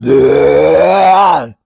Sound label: infec enspotted